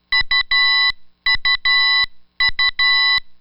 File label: wireless call; densetsu; signal